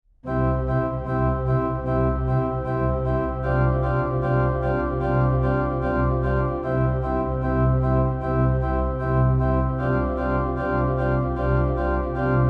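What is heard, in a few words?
praise him with trumpets, organist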